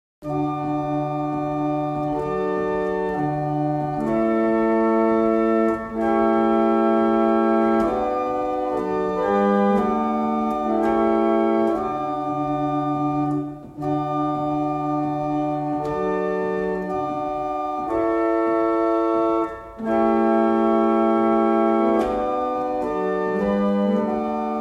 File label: Single chant, barrel organ; Wardley; Humfrey